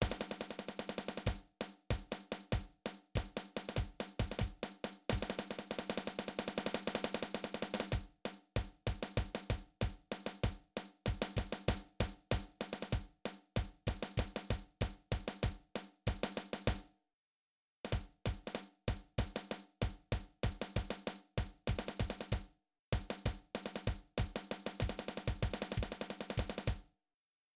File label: Drums a la Rondo